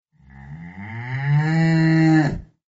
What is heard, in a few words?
animals cow; mace